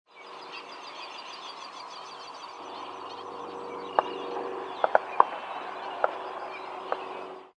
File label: ducks and hunters; Ducks overhead and hunters, Nine Springs 10/6/2003; Ducks and hunters MP3